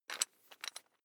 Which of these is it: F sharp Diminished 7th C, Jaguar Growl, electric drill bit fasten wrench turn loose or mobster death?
electric drill bit fasten wrench turn loose